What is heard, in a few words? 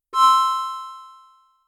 light up